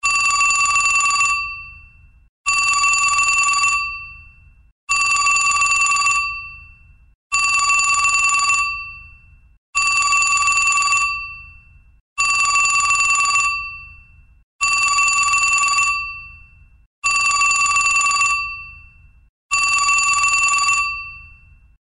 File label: Vintage Telephone, ringtones; LG E975 Optimus G